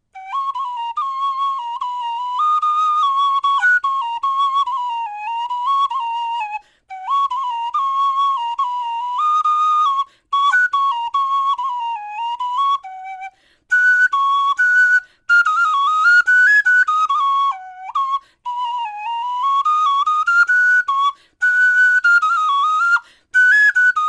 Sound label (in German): melodie